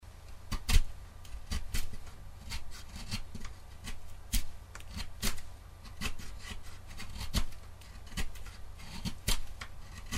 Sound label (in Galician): tallar madeira